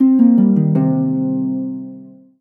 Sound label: MUSIC EFFECT Solo Harp Negative stereo; Simon the Memory Game